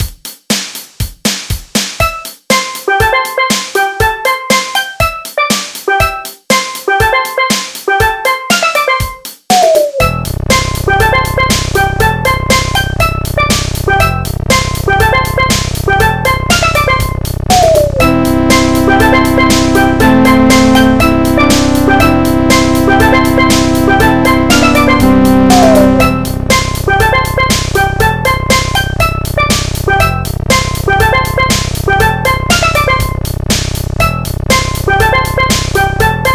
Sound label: drums remix; overworld smash